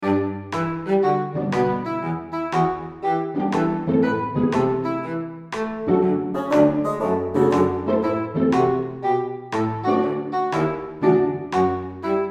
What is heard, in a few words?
travel frog orchestral